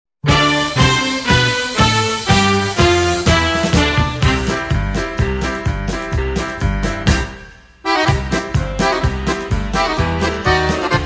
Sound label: POLKA